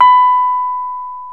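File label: piano Rhodes Mk.V; PNO; Roland LCDP10 Keys of the 60s and 70s 2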